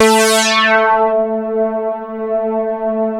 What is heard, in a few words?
LEADS; Masterbits; Vintage Voltage